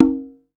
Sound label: Perc, retro house samples, Drum Hits